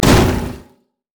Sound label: minotaur pulverize; LoL; alistar